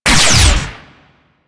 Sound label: Trip Laser Impact; Warframe